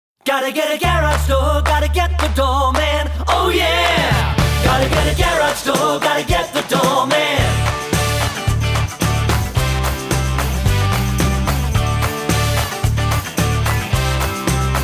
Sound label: jingle; The Doorman